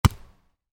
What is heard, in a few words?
Volleyball hit hard, Volleyball (Exterior): Hit Hard; Sports; Miscellaneous Sports